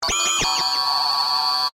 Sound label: Scanner Beam Ray, Scifi; Science, Fiction; Tech; BlastWave